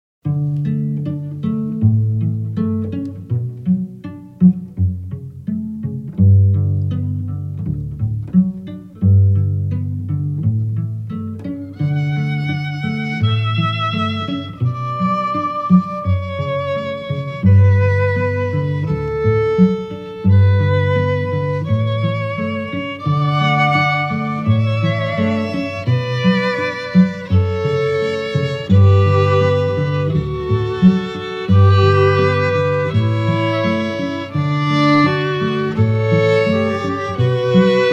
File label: String Trio; Pachelbel, J.; Canon In D